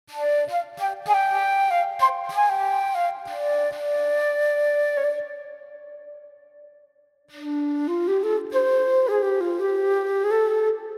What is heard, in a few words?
FLUTE SOLO